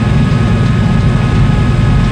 spacegun turret move; Quake 4 Alpha; id software

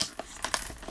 Shotgun turned on side; Foley; DoomRTX

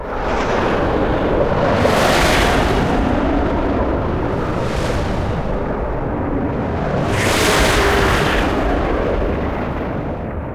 flyovers, ambience; Quake 4 Alpha

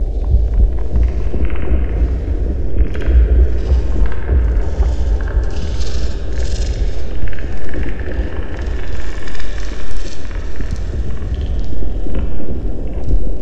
ice loop low; Quake 4 Alpha

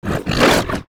imp attack melee, monsters; DoomRTX; imp5

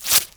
Imp Tear Flesh, Claws flesh; Foley

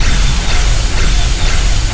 walk right; Quake 4 Alpha; id software; strogg; valkaryne

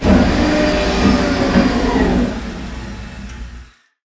cargo doors close; Quake 4 Alpha; id software

